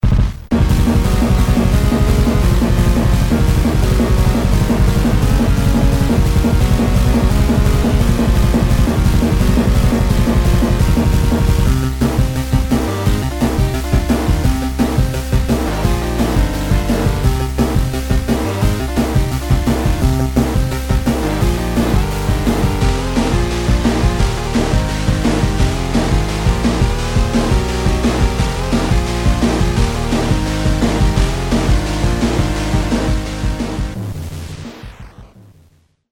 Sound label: NES, made in Famitracker